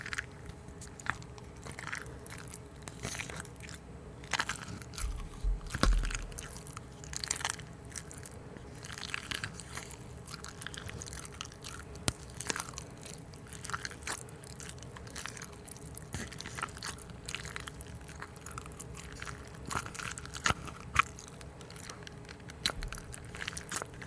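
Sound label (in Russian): звук мяса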